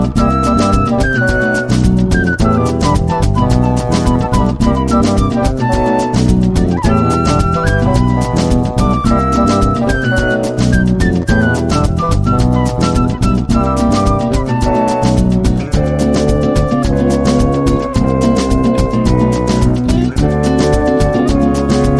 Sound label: INSTRUMENTAL